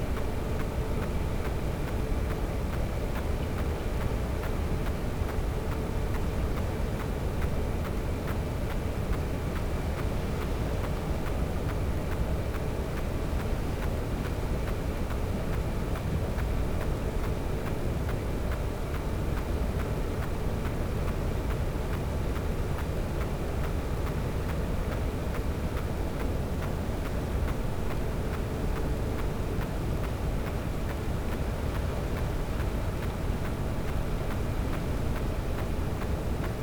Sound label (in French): cachalot negatif, Physeter Macrocephalus